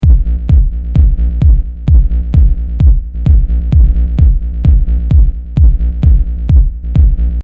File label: house kick bass